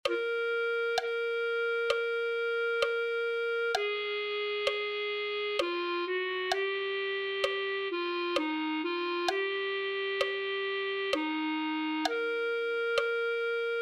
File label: clarinet